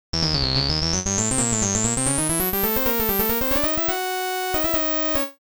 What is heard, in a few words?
Res